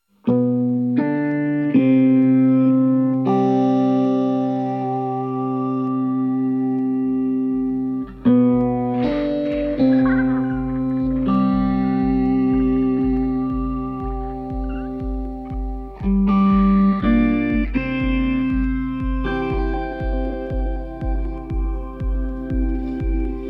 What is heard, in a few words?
Carillon